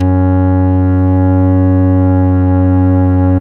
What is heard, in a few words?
SYNTH F#2, SYNTH ORGAN; Best Service Alpha Dance vol.2